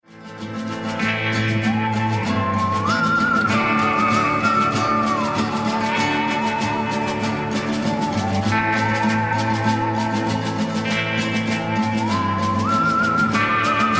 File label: PASTA WESTERN GUITAR RINGTONE